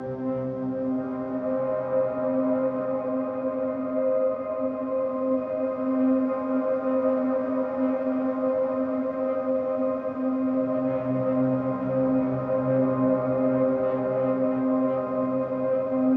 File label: Tension Synth; raji an ancient epic